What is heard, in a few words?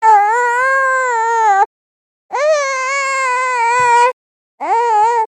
Baby loop